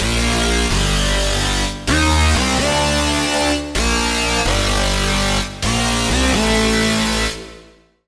Lead; Routed Sound; Serum 2 Expansion - Star Scavenger Vol.1

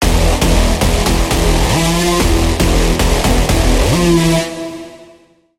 Braam; Routed Sound; Serum 2 Expansion - Quantum Enforcer Vol.1

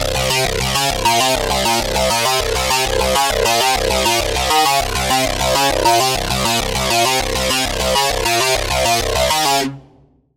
Lead; Emulation Realm; Routed Sound; Serum 2 Expansion - Doom Carpenter Vol.1

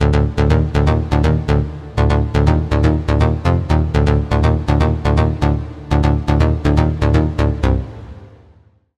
Lead; Routed Sound; Serum 2 Expansion - Noble Gangster Vol.1

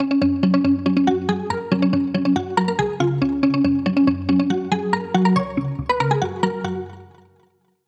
Pluck; Yellow Light; Routed Sound; Vital Expansion - Story Walker Vol.1

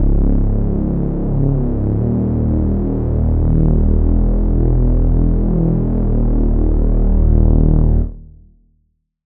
Reese; Routed Sound; Serum 2 Expansion - Street Vanquisher Vol.1

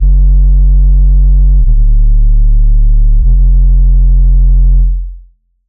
Sub; Sub - Chaos Flavor; Routed Sound; Serum 2 Expansion - Chaos Bringer Vol.1

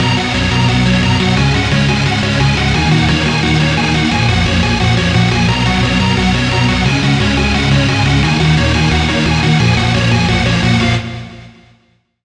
Chord; Flame Totem; Routed Sound; Vital Expansion - Flame Summoner Vol.1

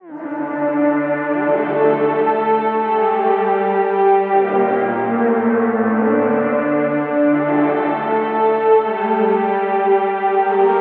Lead; Routed Sound; Serum 2 Expansion - Street Vanquisher Vol.1

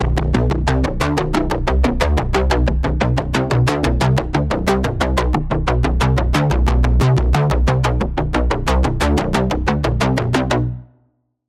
Pulse; Routed Sound; Spire Expansion - Quantum Enforcer Vol.1

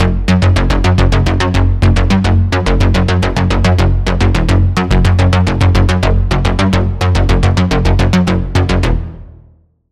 Bass - Synth Step; Routed Sound; Vital Expansion - Doom Carpenter Vol.1

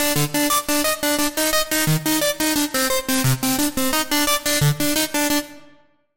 Lead - Noise Hit, Sylenth1; Routed Sound; Sylenth1 Expansion; Chaos Bringer Vol.1

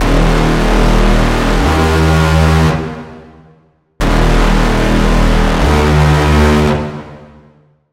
Braam; Abyssal Brawler; Routed Sound; Vital Expansion - Quantum Enforcer Vol.1

